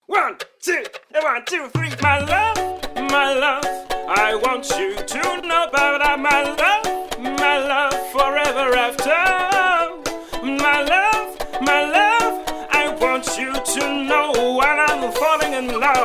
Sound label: ronroco